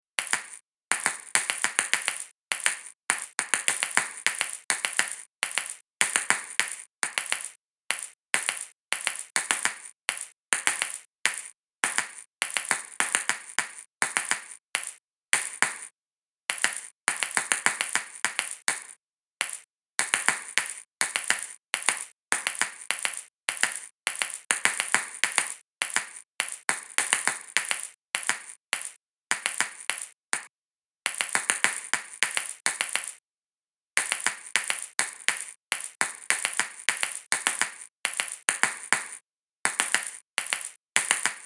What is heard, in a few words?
ASALATO